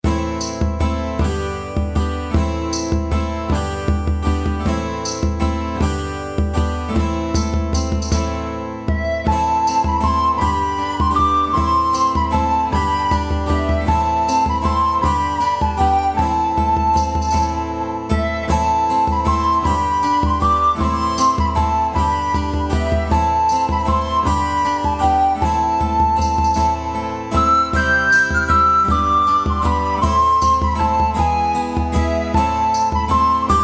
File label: Gingle